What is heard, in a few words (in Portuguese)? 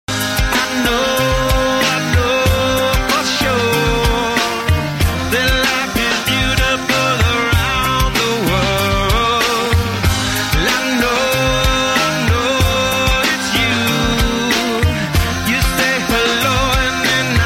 baixo; instrumentos